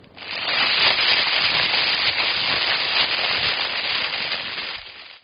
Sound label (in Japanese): ジャラジャラ・・・, 生活音; 生活音系効果音